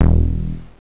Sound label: bass; Angelic Layer